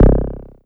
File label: DSP Hit